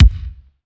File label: Soft Kick; Vengeance Samples